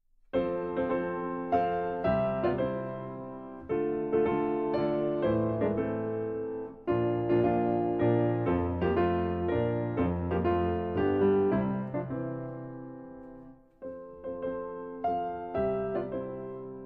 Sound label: mazurka; chopin